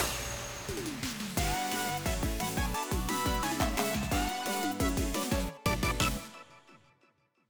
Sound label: JGL Mission Stage Boss Clear